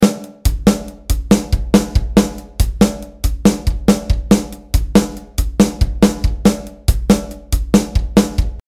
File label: irregular 5t 3322